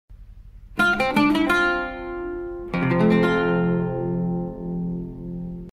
Oud; ساز عود

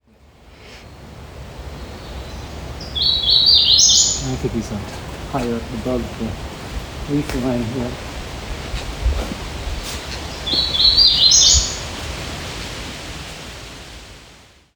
Wilsonia citrina, پرنده سسک روپوشدار

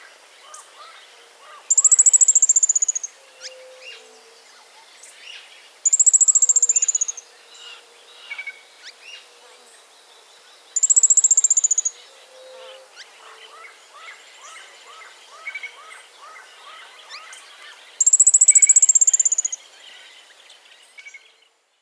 Piculet

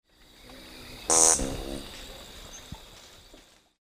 Frilled coquette

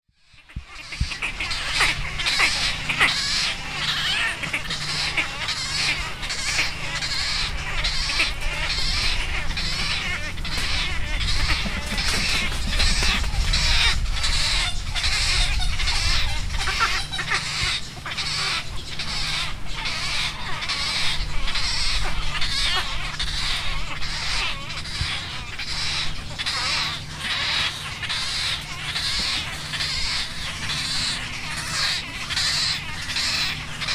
Wood stork; لک لک جنگلی

